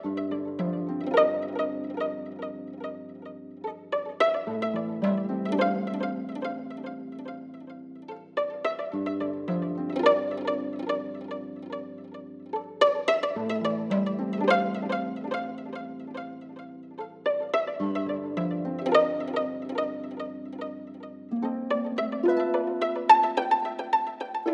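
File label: creepy loop 108bpm A minor; bugcity; chicory a colorful tale; ps4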